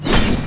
slash

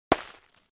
snow

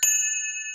beep

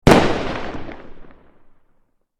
boom

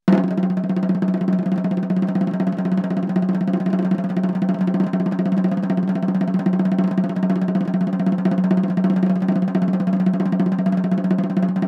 Snare Drum Snare Drum Executioners Roll, Gallows

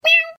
Cat Meow

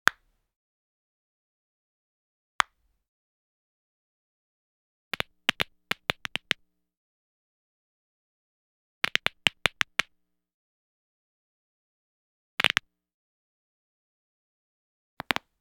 Human, Crack Knuckle

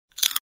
crunch

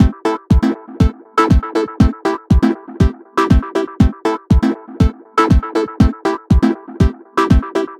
music

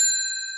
beep

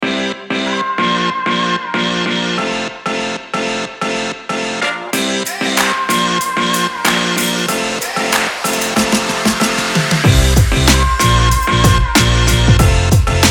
game beat

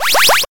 collect

